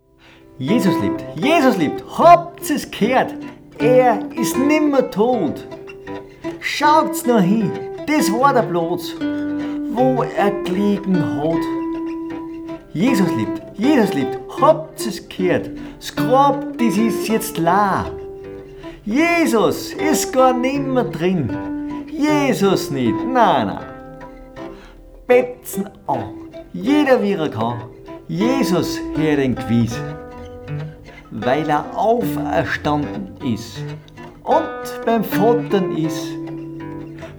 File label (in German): Christliche Volksmusik Lied